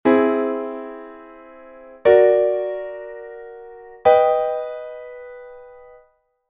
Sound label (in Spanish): aumentados; 5ª Aumentada